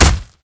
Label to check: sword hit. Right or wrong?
Right